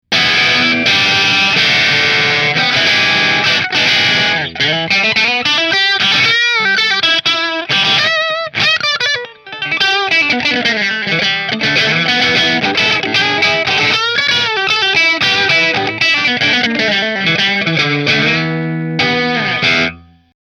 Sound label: Sunburst Position 5 Marshall